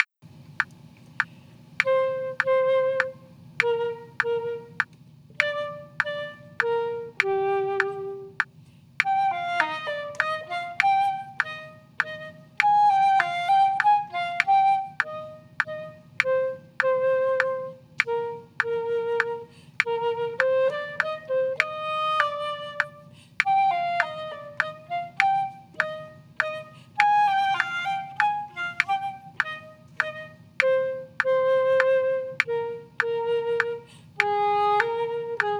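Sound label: trio with percussion, minuet; bach petzold; toe excellence in chamber music bk 2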